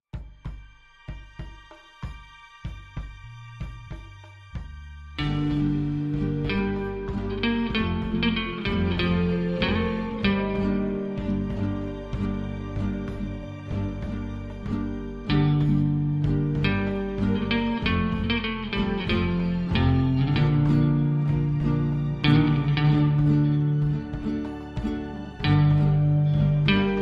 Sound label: Cantina Sunset, Electronic